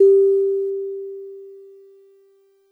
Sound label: DreamLike DX100 G -1